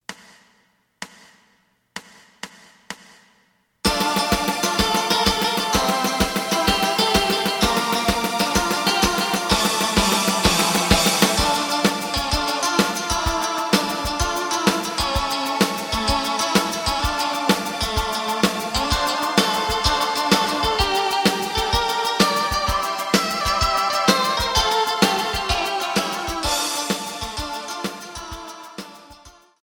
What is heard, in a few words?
bass